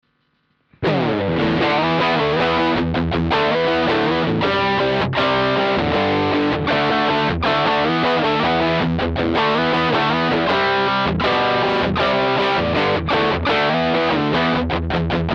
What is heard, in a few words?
wayhuge green rhino overdrive mkv